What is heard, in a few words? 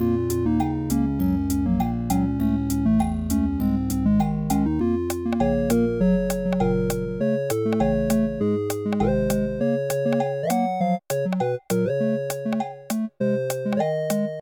battle